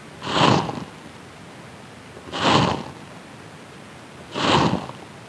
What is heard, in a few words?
Umbonia nymphs, treehoppers; Cocroft